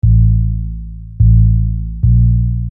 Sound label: Sine Bass